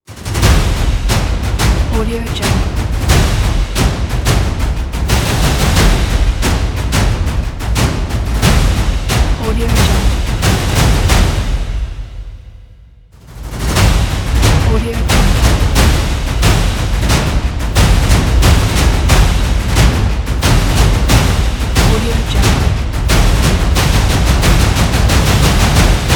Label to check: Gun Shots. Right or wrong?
Wrong